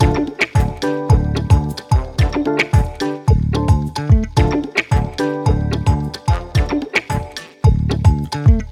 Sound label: Funk Continue 110bpm